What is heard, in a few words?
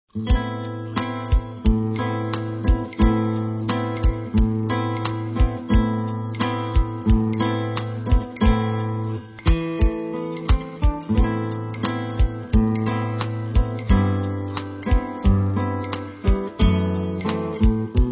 Bossa Triste